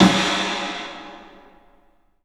PA DEEP R, CLUB SN VRB; 3D Sound; 3D Drums

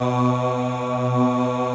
aahs; Greytsounds; F2-SYNCLAV 2

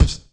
kick low lofi burst

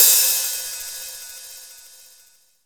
HH OP, Drum Dry; East Collexion; Drum 1

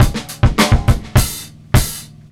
beat, Roots Funk; USB Soundscan vol.41; AKAI

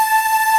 Ending loop

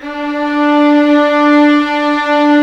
VIOLIN D 3

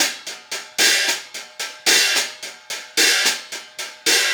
Hi Hat Pattern; Percussion; Essential Percussion; Hi Hat Patterns

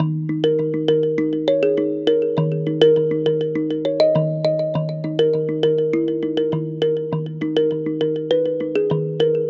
Mbira Echo; Melodic; Ambient-Melodics-II

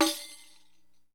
HI REQ; Deff Bendir &Req

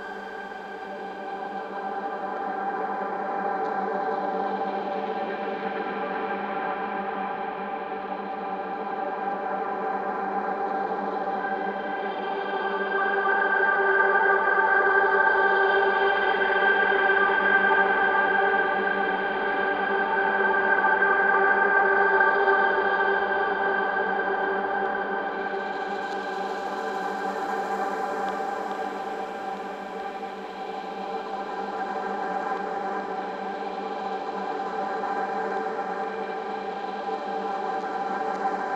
Lush Pad G

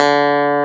clav; FUNK

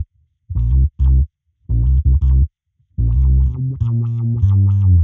Bass FX 95bpm C; musicradar; dub designer

